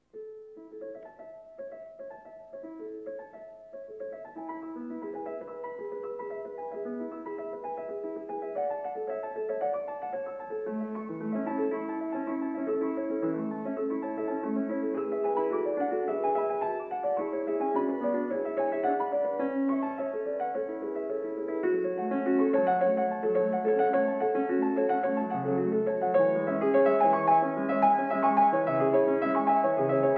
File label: Fugue A-dur No. 7; Shostakovich; Preludies and fugue op.87